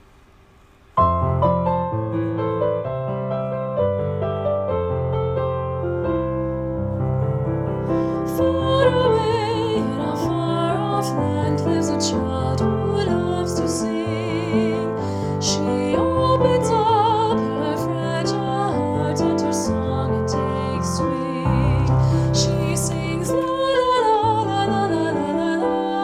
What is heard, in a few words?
she sings alto